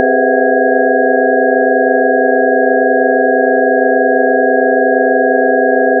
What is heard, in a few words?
sinus